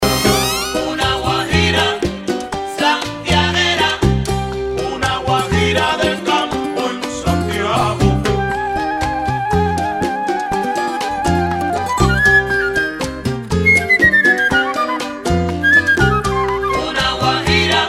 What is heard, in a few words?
guajira son